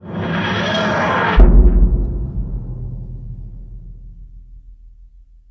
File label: repair finish, tardis; drmatt